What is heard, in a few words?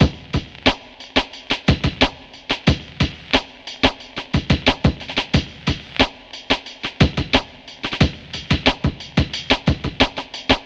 Record, chillout